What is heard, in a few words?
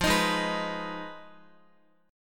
F Minor 7th Flat 5th, Guitar; Fm7b5; strummed; x,x,5,8,8,7